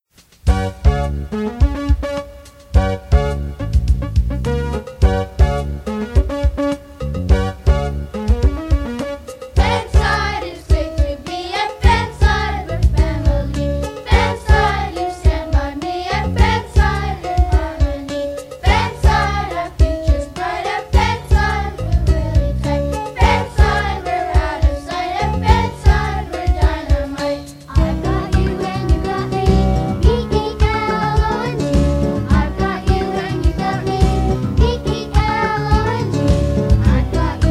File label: Fenside Song